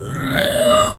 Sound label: zombie growl